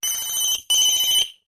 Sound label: Distorted Beeper